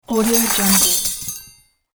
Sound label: kitchen utensil pour